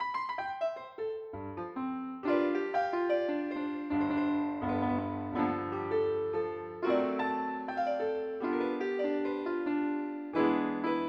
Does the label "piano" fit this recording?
Yes